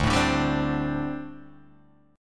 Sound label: D 7th Suspended 4th, down, Guitar, Open G